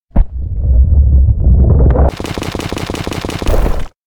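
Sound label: electronic bigserpent break rock; anemoi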